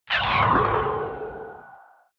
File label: Retro Anime Robot Long